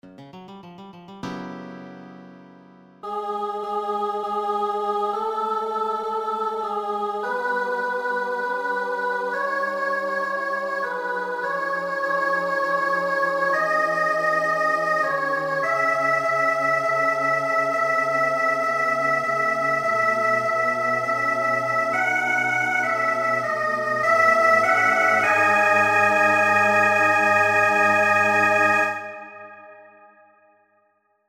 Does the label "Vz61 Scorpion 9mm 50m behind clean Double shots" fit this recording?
No